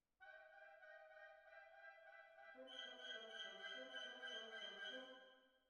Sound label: Cbasses